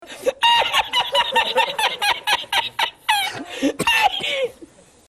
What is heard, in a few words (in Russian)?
smeh